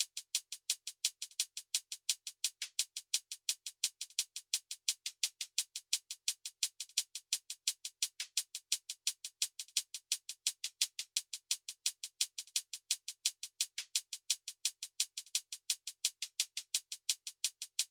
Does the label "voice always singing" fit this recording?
No